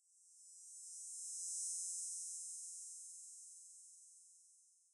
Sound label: cicada